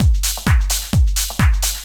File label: drum 130 dance, drumloops